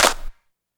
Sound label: Claps & Snares